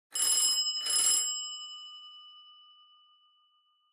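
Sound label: Doorbell Mechanical Bell Multi; ドアベル 機械式 ベル; マルチ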